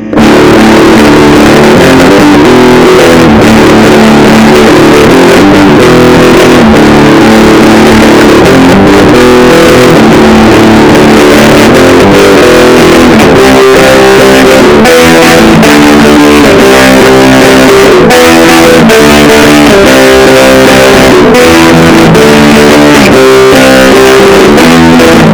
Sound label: Triangle Original, Big Muff Fuzz; JHS Muffuletta; Stoner Rock; guitar riffs